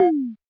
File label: Rhythm Tom Perc, Realistic Concertmate-670; Rhythm Layered Kit